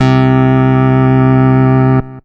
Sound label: Roland D-20 Space Lead C4